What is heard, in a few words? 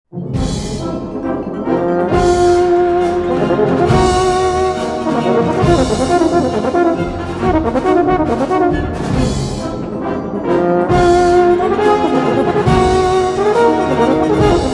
concertino for euphonium